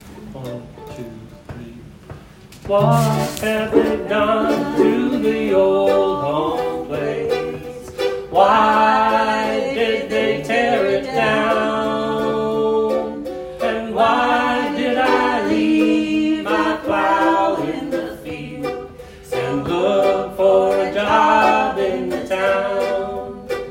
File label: singing harmony